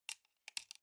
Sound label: sunglasses plastic side piece fold soft